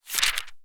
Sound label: page